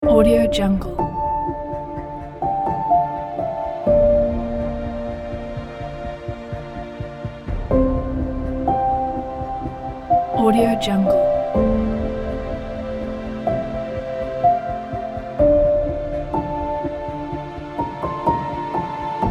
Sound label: exciting cinematic